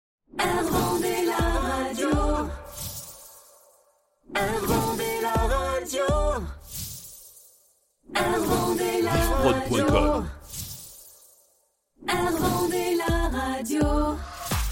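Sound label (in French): Radio Signature Chantee x5 Versions, chant; Air Vendee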